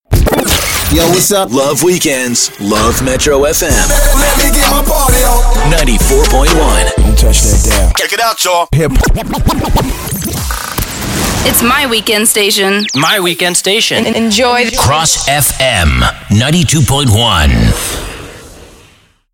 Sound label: jingles